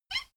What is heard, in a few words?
folding chair squeak short